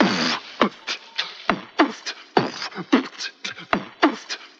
wet sandwhich, Beatbox, Drum loops

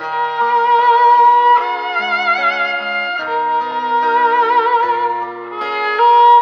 strings; Sad

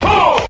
huh group of men

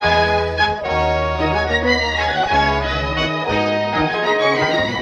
horns, Phonky loops

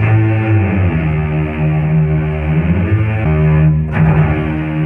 strings heavy sadness; Loops

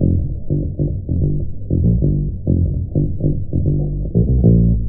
bass; Phonky

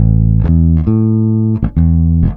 bass

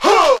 "huh", voice

